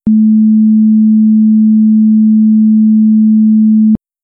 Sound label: medium frequency sine wave